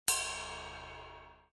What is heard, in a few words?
cymbal; drum pad